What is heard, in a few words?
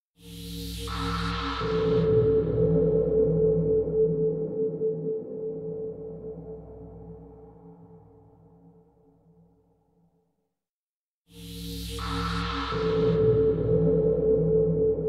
steam; Far Cry